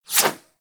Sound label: CY Xbow Whoosh C; Tenno